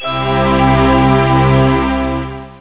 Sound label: Spirit bell MIN